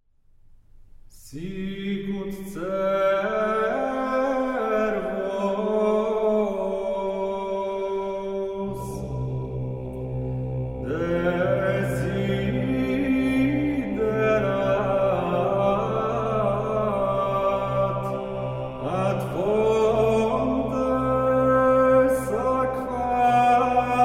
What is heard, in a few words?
Tractus; Sicut cervus